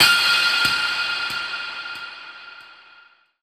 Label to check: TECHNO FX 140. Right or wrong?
Right